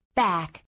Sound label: back button; disneyjunior